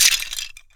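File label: debris small; LEGO Star Wars Force Awakens; Junkbot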